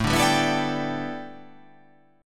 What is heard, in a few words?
A 7th Suspended 2nd Suspended 4th